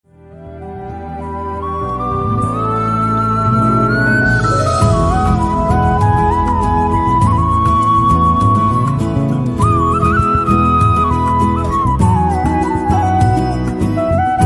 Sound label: Krishna enchanting flute ringtone